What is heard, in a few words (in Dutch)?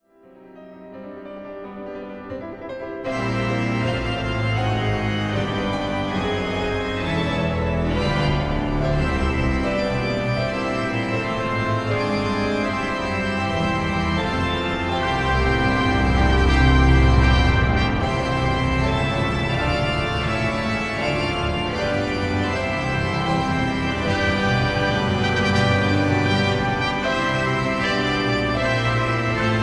Thema uit de Orgelsymphonie